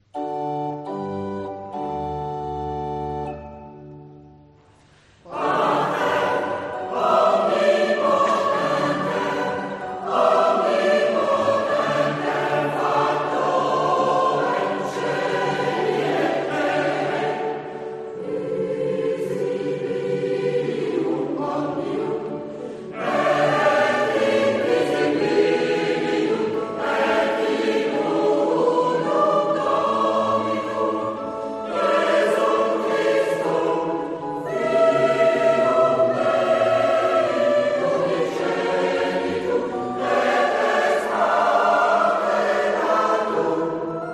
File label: Credo Allegro; Concert Mozac